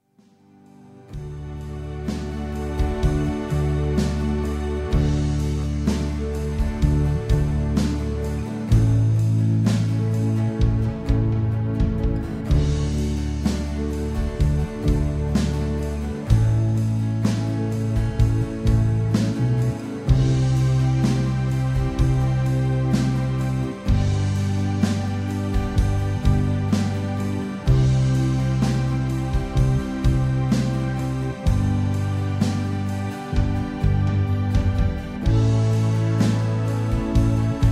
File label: 1st solo backing track